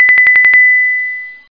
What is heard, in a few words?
Drring; Ingemar's skiing game 1.0a